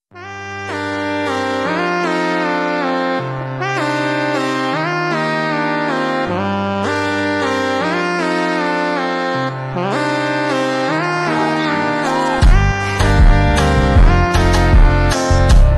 kompa slowed reverb

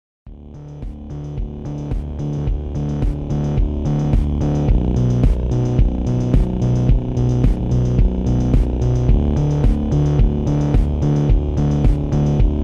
slowed reverb muffled

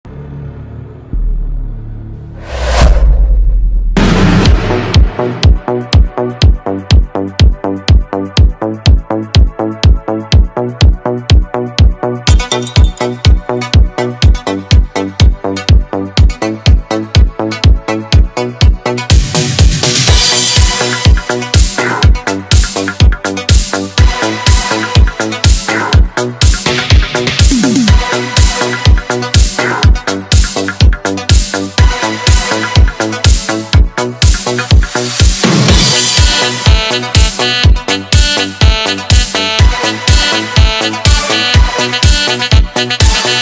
italo disco dance mix; new italo disco

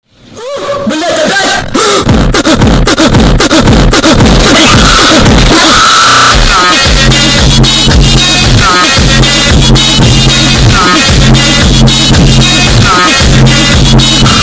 funk speed up bass boosted

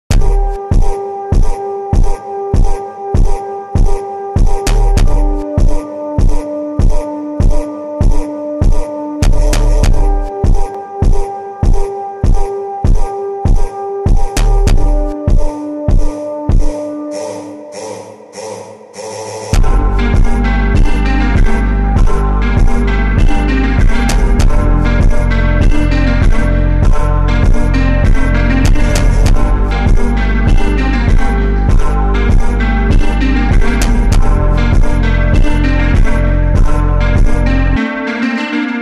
funk super slowed

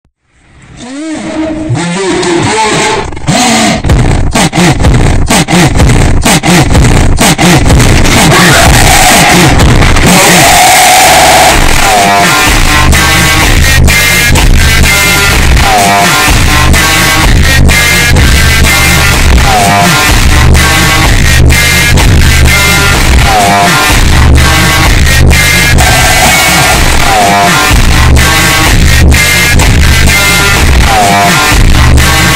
funk mega slowed bass boosted

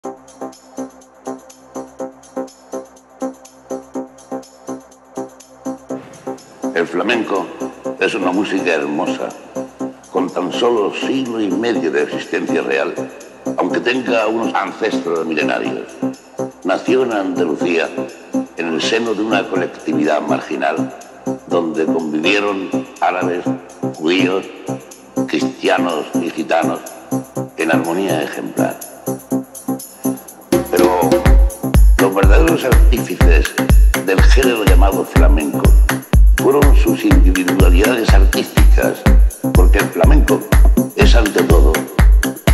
flamenco afro house

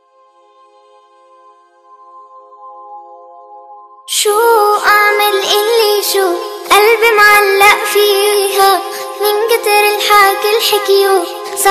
original arabic nasheed; with arabic and urdu subtitles

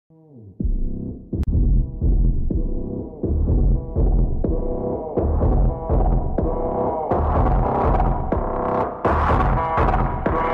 funk slowed

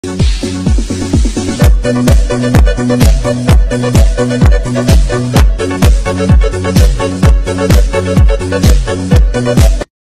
shuffle; walking dance

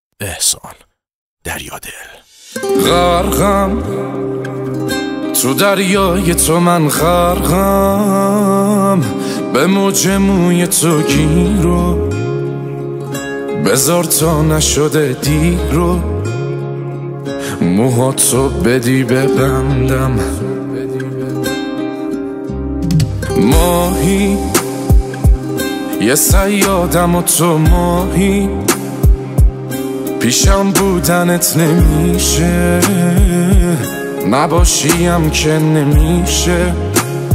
bass

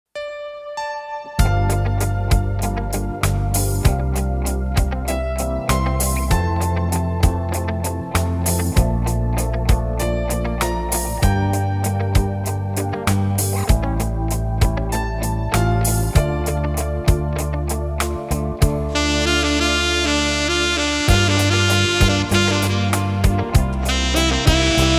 saxophone cover; jk sax

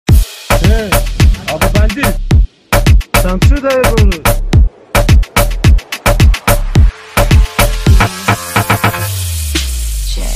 club mix

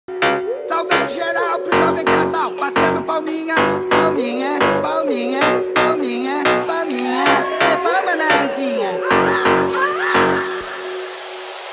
funk remix

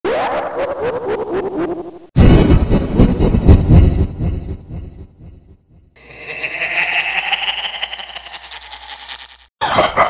laughs; sonic exe the disaster 2d remake; all exe laughs

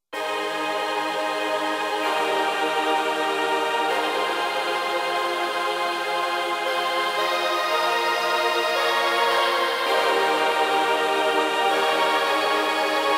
italo disco mix Korg Pa5x; Korg Pa5x Musicant 2024

